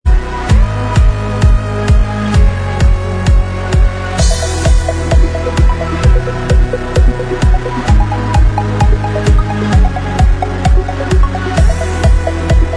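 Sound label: dance remix instrumental track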